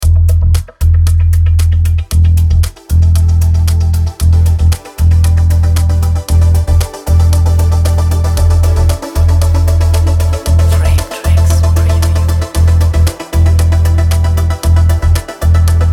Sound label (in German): ohne Melodie, industriemusik; frametraxx